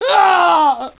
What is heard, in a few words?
pain; svencoop; decay